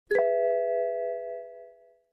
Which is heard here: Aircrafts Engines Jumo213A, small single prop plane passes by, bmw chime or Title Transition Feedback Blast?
bmw chime